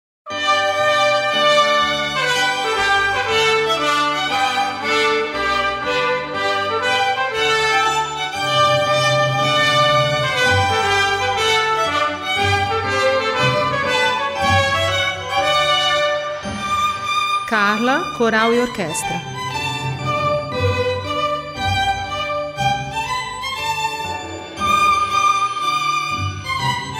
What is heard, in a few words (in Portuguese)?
TROMPETE TUNE